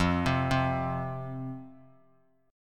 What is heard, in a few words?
F 5th F, down, Guitar; F5 arpeggiated